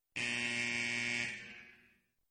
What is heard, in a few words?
End of period buzzer